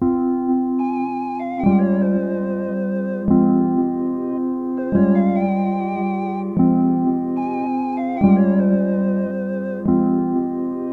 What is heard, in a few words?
146bpm